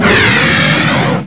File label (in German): dampf; hurrican